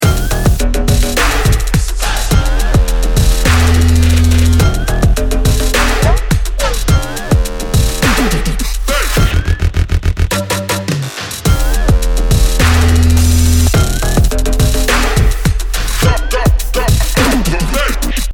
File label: drop the bass; はげしい